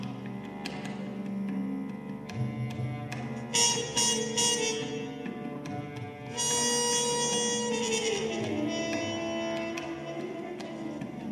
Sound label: tuning